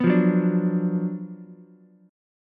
F sharp Major 7th Suspended 4th Sharp 5th, F sharp, down, Ukulele; F#M7sus4#5 strummed